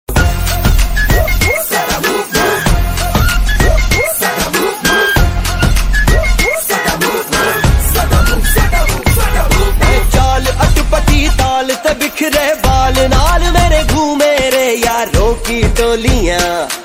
hindi ringtone

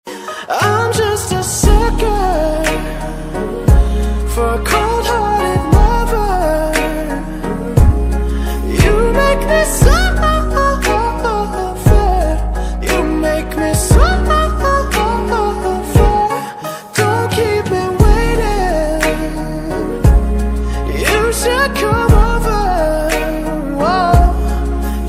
english ringtone

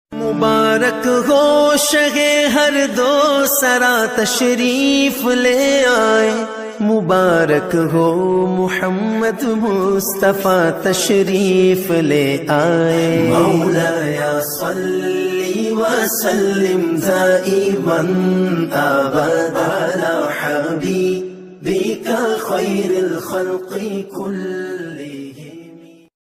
islamic ringtone

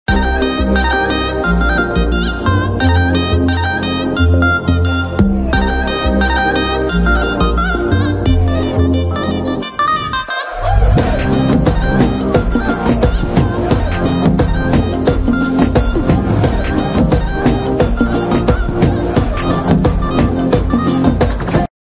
BGM ringtone

